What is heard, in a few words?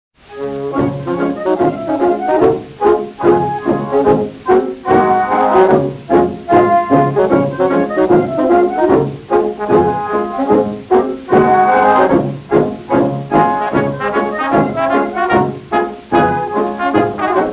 waltz